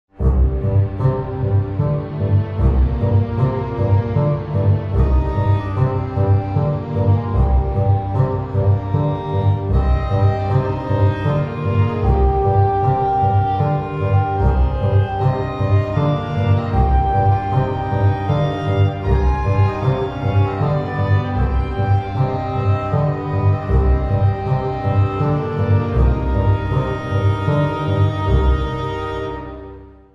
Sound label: Tavern; Necropolis; heroes of might and magic online 2010 pc